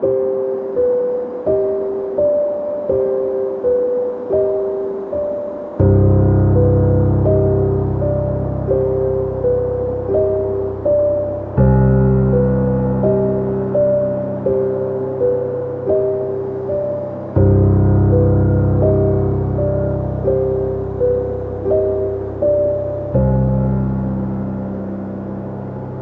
An isolated woodland Dreary Piano; HauntedBloodlines; HORROR MUSIC BOX

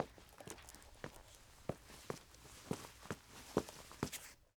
Toddler Walk; Footsteps; HauntedBloodlines

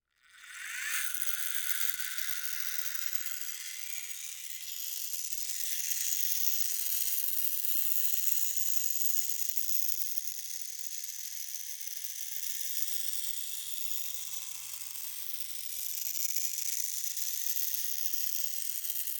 Clock Motor; Haunted Bloodlines; SFX Producer

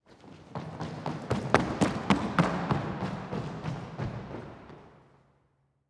Footsteps Wood; HauntedBloodlines; SFX Producer

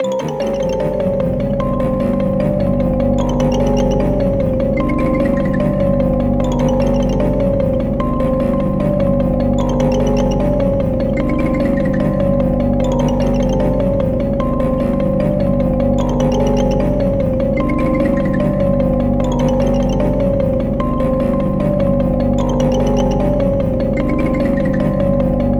Broken Toy Weird Bell; HauntedBloodlines; HORROR MUSIC BOX

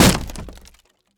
Impact Door Break; MinorHallutinationsSounds; SFX Producer

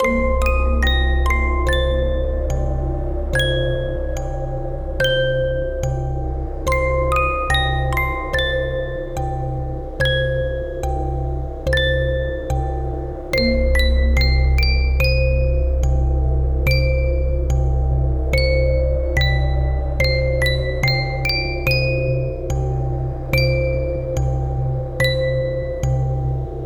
Creepy Musicbox Drone In, Loop; HauntedBloodlines; HORROR MUSIC BOX

